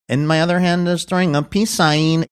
singing and my other hand is throwing up a peace sign